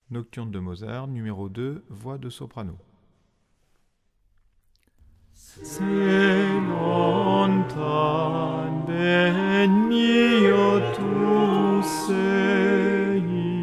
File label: soprano; se lontan